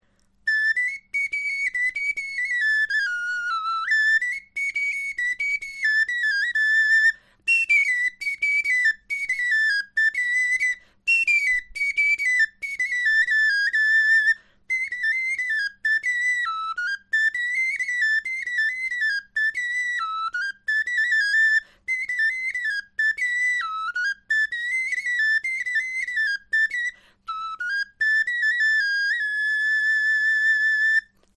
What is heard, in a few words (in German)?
melodie, knochenfloeten